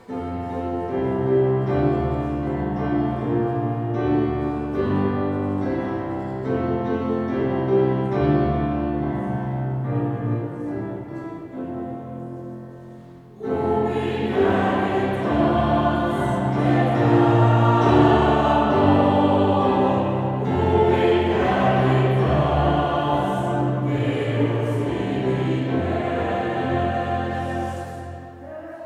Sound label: Taize